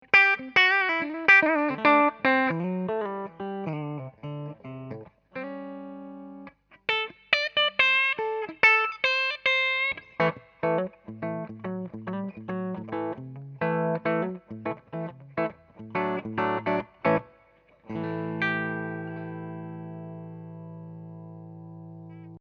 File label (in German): Steg PU Clean